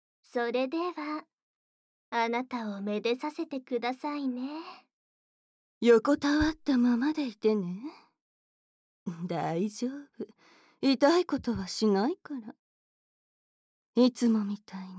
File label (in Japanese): シコシコ ＆ 耳舐め; シコシコ(ヴァイス)＆耳舐め(シュヴァルツ); ASMR